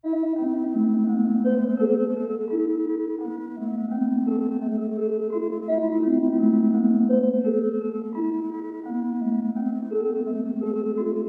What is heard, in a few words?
Dark Melody Loop 170 BPM F# Min; Cymatics; 2020 Melody Collection